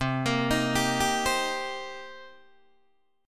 C Minor 7th C Guitar Open D

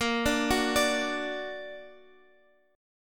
F sharp Augmented; x,x,8,7,7,10

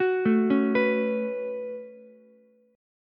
A 6th Add 9th A down Ukulele C6; A6add9; arpeggiated; JGuitar

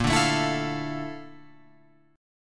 A sharp Major Sharp 11th, down, Guitar, Open F; strummed; chord; JGuitar

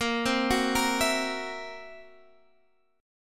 C 7th Flat 5th A sharp, chord

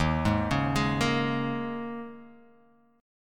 Eb 7th Suspended 4th, guitar, Open F; Eb7sus4; arpeggiated; 3,3,1,3,1,x; JGuitar

